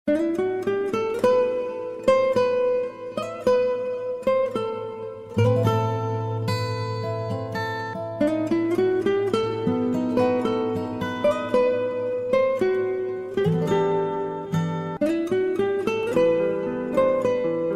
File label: Romantic guitar